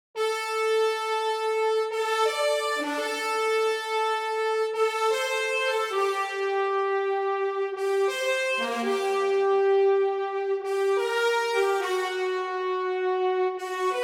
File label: Horn